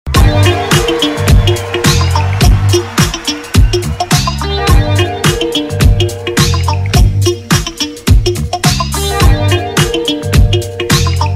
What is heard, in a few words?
Instrumental Ringtone; Bollywood; Daddy